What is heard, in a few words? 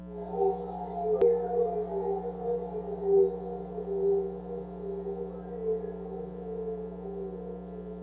bell